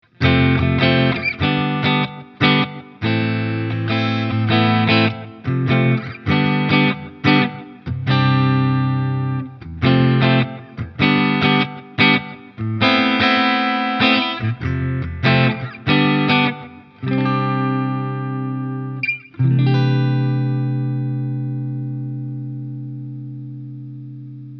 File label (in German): PRS SE Custom 24 Roasted Maple Mitte clean